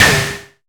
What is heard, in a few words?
BURST, Prophet Five; E-MU Formula 4000 Series Vol. 3; Analog Odyssey